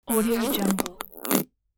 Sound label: whoopee cushion